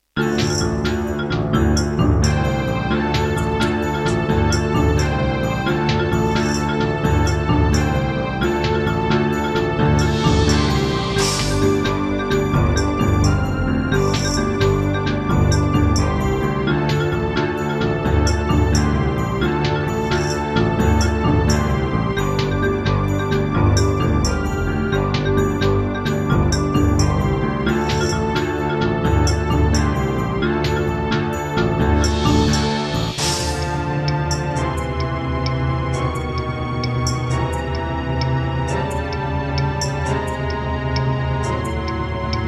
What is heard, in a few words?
Creative Wave Blaster II; Heretic